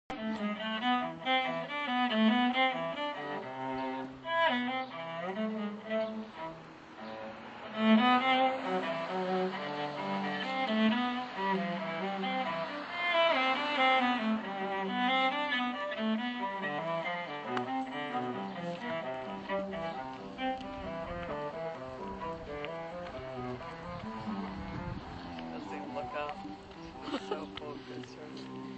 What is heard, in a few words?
a busker plays Bach on the double bass; recorded in stratford on august